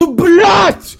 bliaaaac

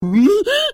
falling; bad piggies ross

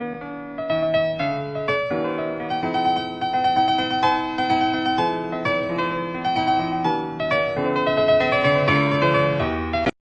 haunted piano

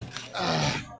ahhh

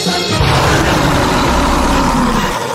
sea monster roar; spongebob

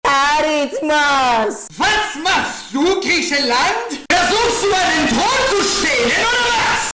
germany screams loud

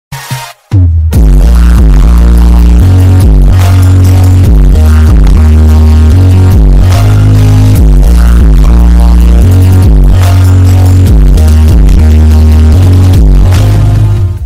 outro song bass boosted

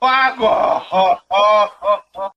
oh oh oh

